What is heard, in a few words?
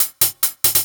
Hi-Hat Loop, Drums, Dance Beats